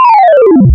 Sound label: Gamelan, wavetables; Animoog